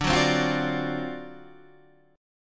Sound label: D 7th Sharp 9th D, chord